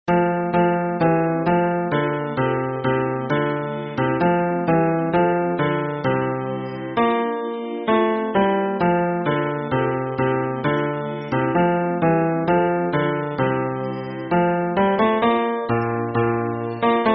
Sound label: hymn bass